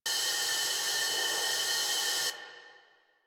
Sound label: Pipe Steam Loop; Warframe; Caliber Chicks minigame; environment